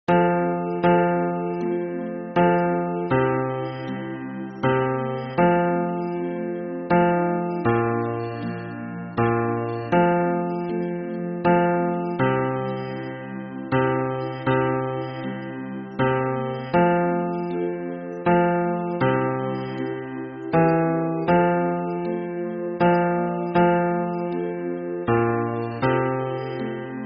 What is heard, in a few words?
hymn bass